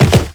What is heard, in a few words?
Land Soft Wood, Footsteps; Warframe; Characters; Operator